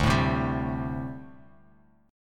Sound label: D 7th Suspended 4th, chord; D7sus4 strummed